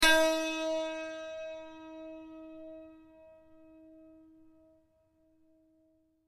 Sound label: santor mi